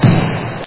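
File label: ball sound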